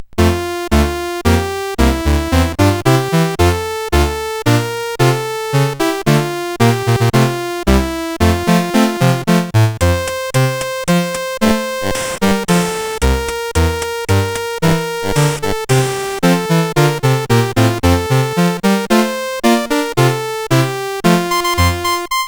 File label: Fireworks Celebration, CSG8580R5